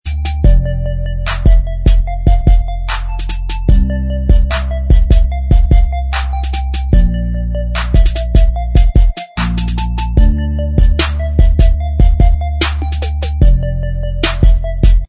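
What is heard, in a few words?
instrumental, backing track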